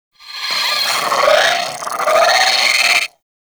Sentient Brachiolyst Attack; Warframe

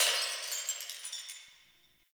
Distant Glass Breaking; Warframe; Ambience; NineteenNinetyNine

